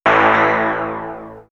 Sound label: Swirls Ricochet, pads; X-Static Goldmine